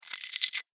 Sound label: Insect Voice Click, Insect, Voice, Clicks, Vocalization, Bug; Sound Effects:Animals:Insects; Blastwave